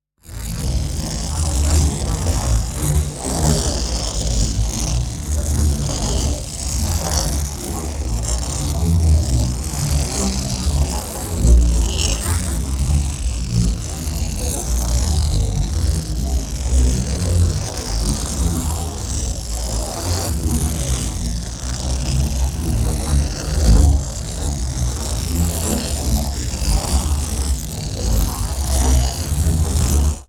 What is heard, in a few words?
PARTICLES LARGE SLOW Marbles In A Pipe; NEON; boom library